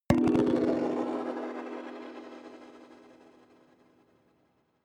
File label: notification kalimba magical body hit